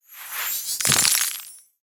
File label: Buy Gems; mighty party 2017 gamerip pc ios android